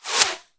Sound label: close